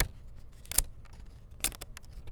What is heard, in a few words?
Plastic Thump; BB Retail Therapy Sample Pack